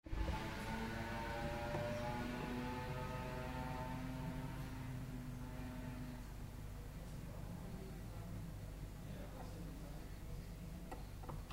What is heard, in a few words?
Foley